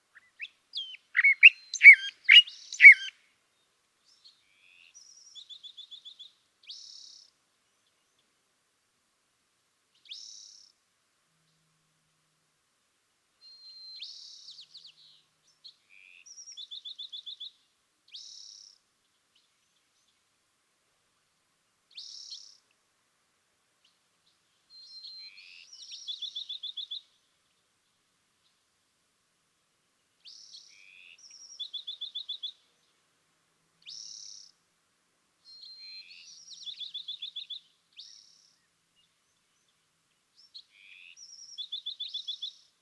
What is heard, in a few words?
California Thrasher